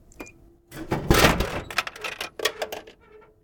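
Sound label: can from vending machine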